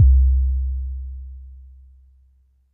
sound view hover enter

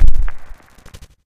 sound ringer silent

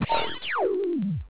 robot dead

